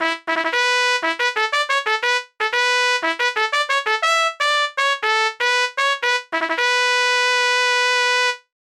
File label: Silver Trumpet